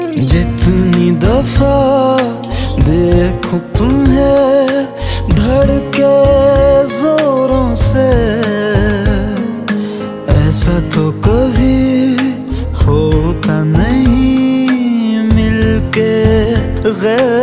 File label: Hindi Song; ringtones